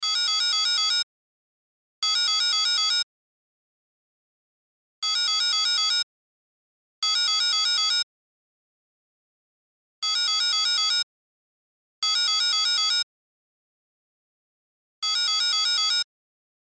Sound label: Cell Ringtone; Loop; Cell Phones; Blastwave